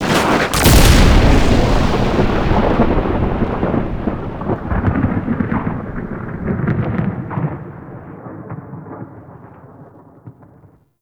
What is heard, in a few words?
Murmur Sky Thunder Clap F; Warframe; Ambience; Duviri